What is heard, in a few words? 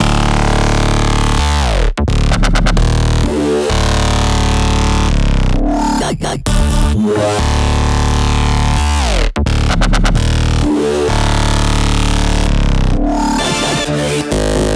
130BPM Melody Root D; Vengeance Samples; Change Kit